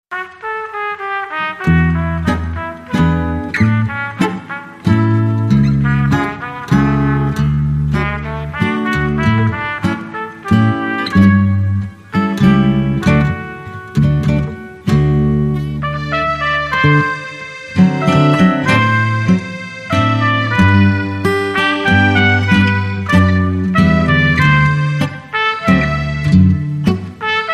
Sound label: Trumpet Walz